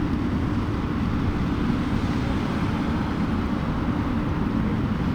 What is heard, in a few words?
city; norleans